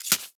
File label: Micro Step Snow